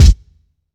airy kick drum sample d key

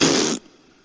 BLOWS A RASPBERRY; Body sounds

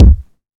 short reverb kick single hit C key; tuned drums

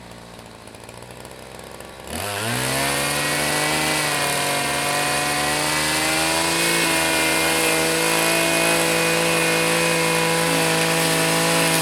grass trimmer

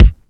studio bass drum single hit g sharp key

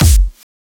small reverb snare one shot f sharp key

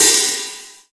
tamed open high hat sample a key

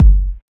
boomy steel kick drum sample g key

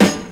old school rap acoustic snare sample g sharp key

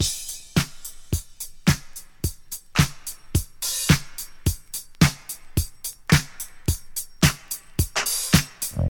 108 bpm drum groove f sharp key

